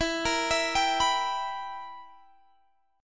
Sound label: B Major 7th Suspended 4th Sharp 5th E